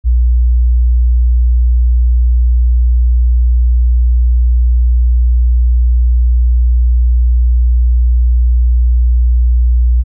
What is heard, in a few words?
hum